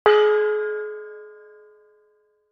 PERCUS

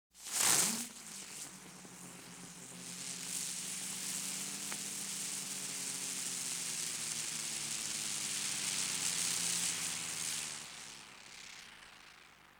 Pinwheel

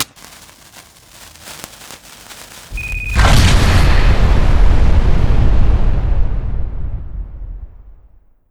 bomb no count; busterbomb; Particle-Sequencer

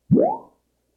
Cartoons Boings; cupcakeLand

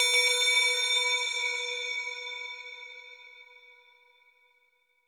new tones

